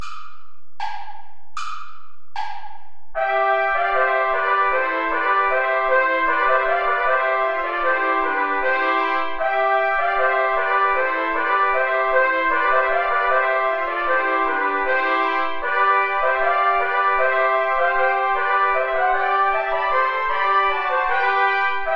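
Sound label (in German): schmückt den saal mit grünen zweigen play along without trumpet; traditional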